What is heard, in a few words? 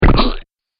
Big Muddy Splat, slimy